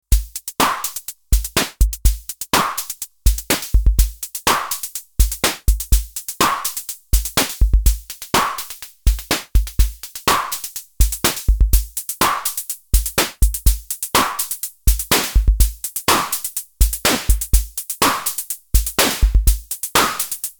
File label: AVP MAD-5 Electro Beat